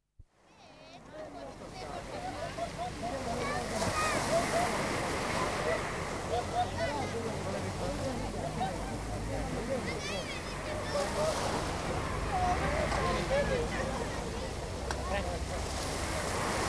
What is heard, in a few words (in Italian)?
MARE SPIAGGIA FOLLA